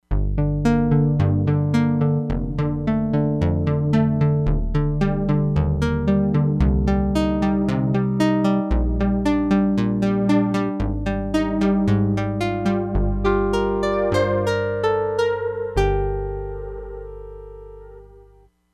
ACOUSTIC PHASED GUITAR, Crumar Bit 99; Factory Programs

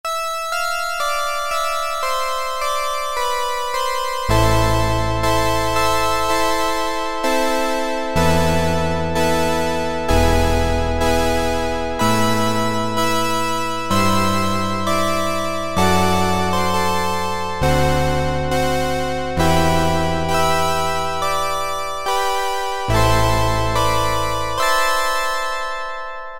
Sustainer, Roland Juno-106; Factory Preset

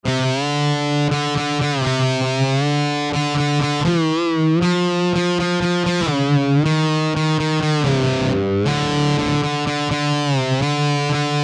SLIDER, Digitech RP200

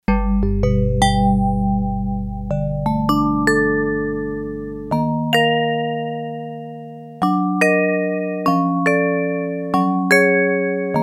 Theme Vibraphone, Yamaha TX802; Performances; Factory Patches

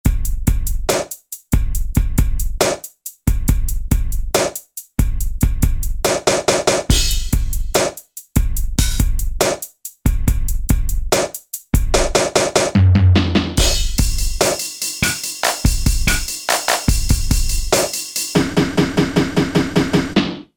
Vinyl Kit; Alesis Rap Techno Dance

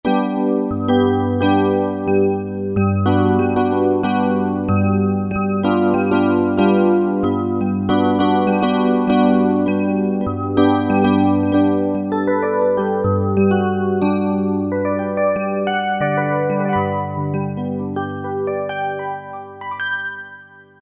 E.Piano 3, Korg M1; Factory preset examples

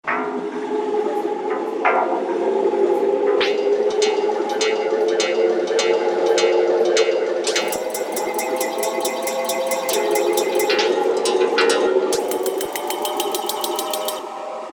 Ghost Train; Special FX; Roland SR-JV80-15 Special FX Collection

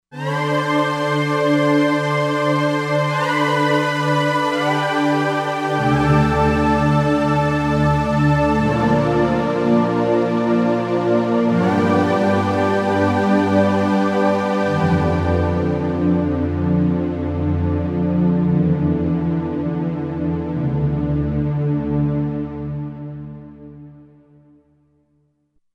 5th Pad, Roland Fantom XR

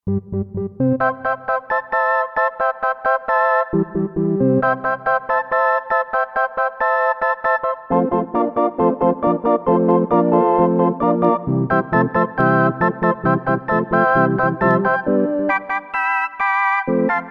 Roland JV-2080; Surf's Up!

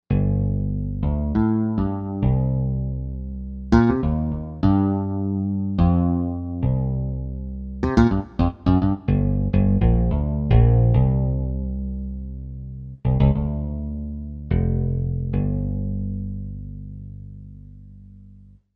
Stick Bass, Korg M1Rex; Factory Patches

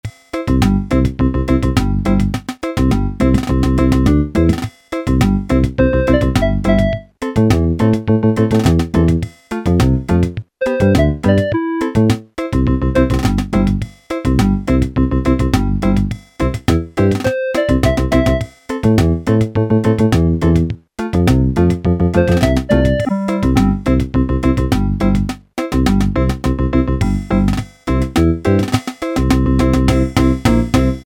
REGGAE, Yamaha SHS-10; Factory style demos

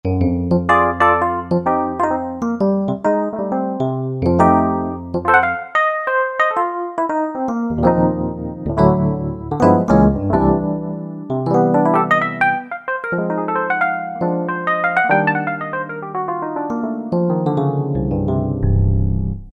Stage 73, Alesis Z4 Vintage Keyboards

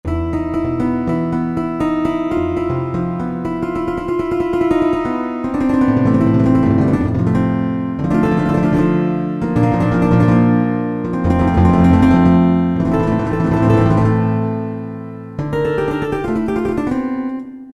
Gypsy Guitar, Roland PN-D50-04

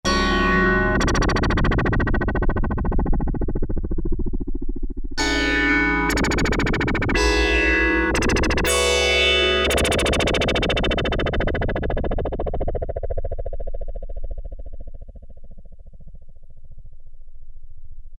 Break Up, Korg Poly-61M

